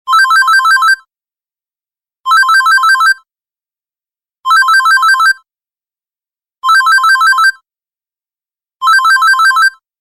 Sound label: Galaxy Beep; LG C330 Etna